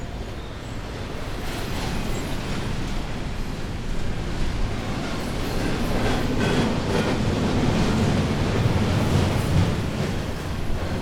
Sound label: TRAIN; SIMUZENSOUND